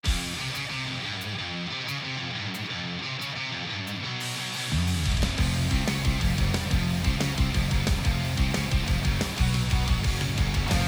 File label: Battle Boss; Type Drakkhenjoe Overdrive